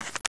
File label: silencer on; cstrike; csgo